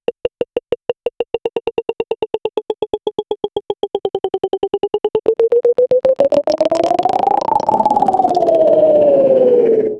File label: small robotic orb named i, robotics